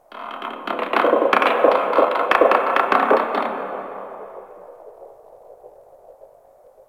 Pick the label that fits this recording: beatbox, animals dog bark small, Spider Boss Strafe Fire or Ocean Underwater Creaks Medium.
Ocean Underwater Creaks Medium